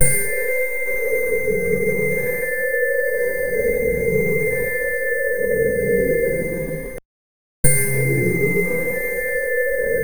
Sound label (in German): horror lachen grandma, character voices